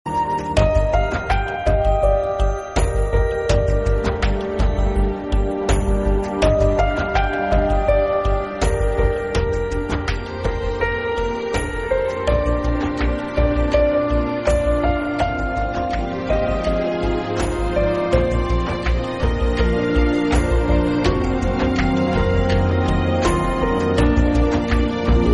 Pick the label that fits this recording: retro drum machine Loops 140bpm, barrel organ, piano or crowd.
piano